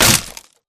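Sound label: Impact Pulse Blade Wood; Titanfall 2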